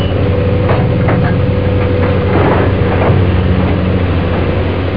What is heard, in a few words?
Crane - Bulldozer; Museum of Anything Goes